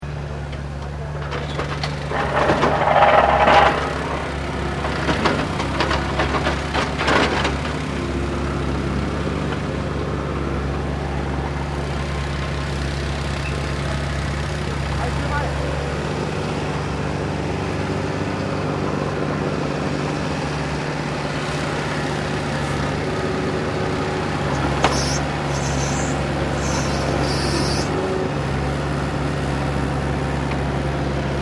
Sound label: AMBIENCE ROAD CONSTRUCTION SITE HEAVY MACHINERY, Roadworks in Japan; Sannohe